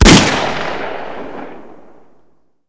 deagle, weapon

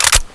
mg clip in, reload

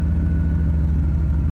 engine, car sound

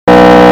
engine, car sound

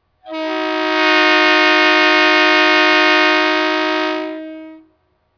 horn, trainhorn; GTW

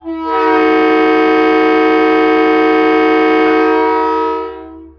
horn, train horn; GTW

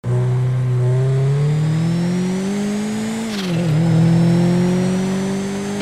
idle, car sound

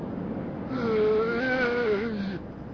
groan, zombies

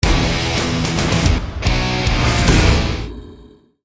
boss Rock Music End

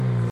engine, car sound

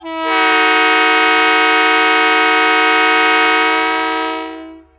horn, trainhorn; GTW

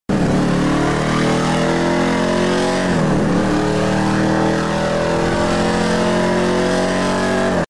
idle, car sound

